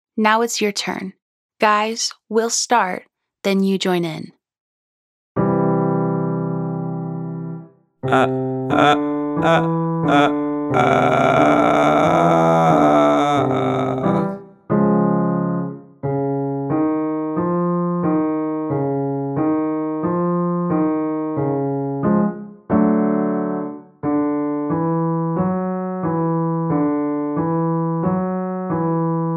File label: Edgy Uh Staccato to Smooth Scale Male